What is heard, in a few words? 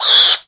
tushkano pain